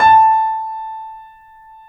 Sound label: A, Piano; InVision Interactive - The Piano